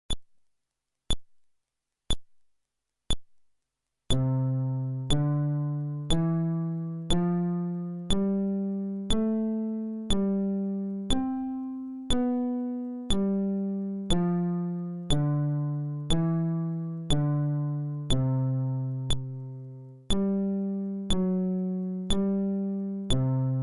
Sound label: Scale, fretless bass